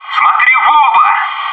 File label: whoo